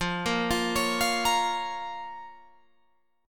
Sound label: F Suspended 4th Sharp 5th F, Guitar Open F; Fsus4#5; arpeggiated